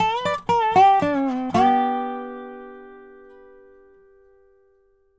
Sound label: DOB LOOPS 120; Country